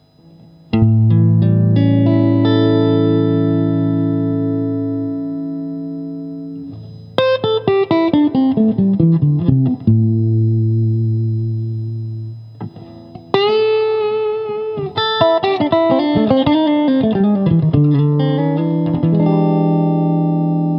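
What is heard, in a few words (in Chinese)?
60S OCC 单晶铜 清音 2档, acoustic guitar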